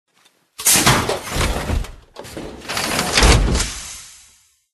enter, power armor; clothing system

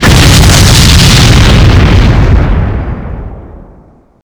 explosion

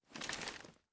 foley light, weapons; cw foley

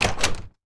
door open

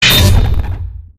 security bot break beam; gow

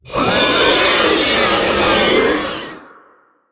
spider guard mode

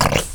zombies misc idle

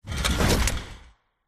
movement foley; gow; silverback

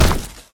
step

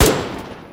tiger mg; weapons

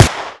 cannon; viper

